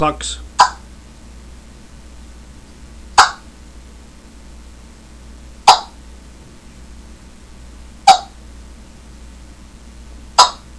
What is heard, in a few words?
sassy hen clucks, turkey; Grand Slam